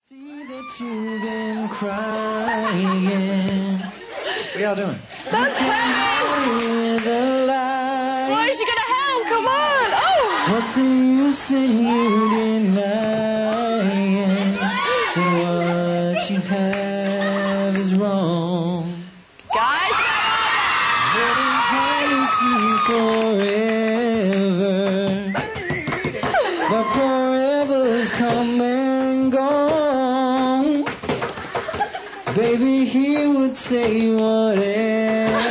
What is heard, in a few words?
acapella